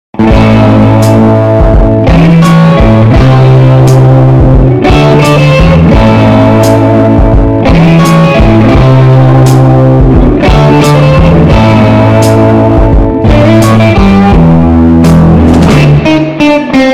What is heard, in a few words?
bassboosted